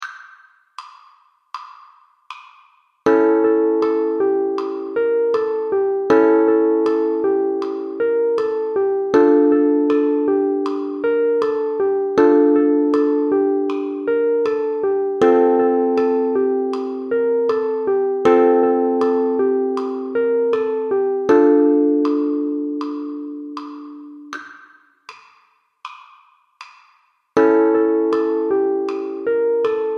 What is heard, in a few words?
80bpm Intro Solo Uke